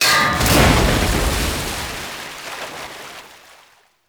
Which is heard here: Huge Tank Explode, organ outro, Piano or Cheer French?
Huge Tank Explode